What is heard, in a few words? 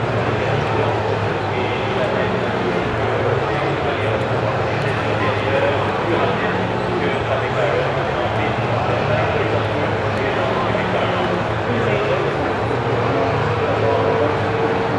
ambi; Mafie 1